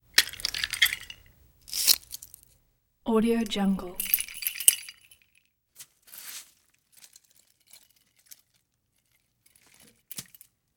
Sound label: whistles whistle grab handle chain; human sounds